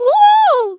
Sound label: Woah; Neru; SM64 CO-OP; Triple Baka Pack